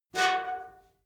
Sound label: metal squeal saturated short